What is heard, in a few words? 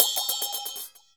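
CHINA, China cymbal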